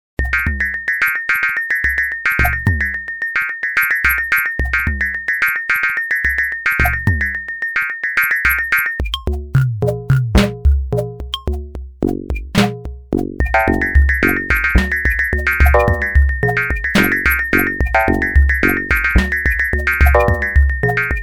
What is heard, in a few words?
zvon kit